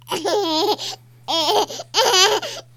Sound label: Child Laughter